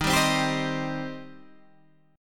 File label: Eb 6th Eb down Guitar Standard